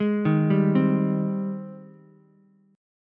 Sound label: G sharp Major 7th G sharp, Ukulele; JGuitar